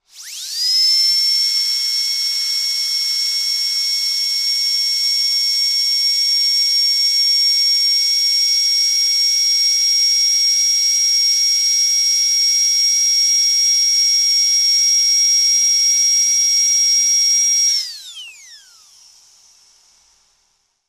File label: Dentist Drill Steady